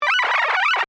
Communication Radio, Scifi, Communication, Radio, Scramble, Musical, Science, Fiction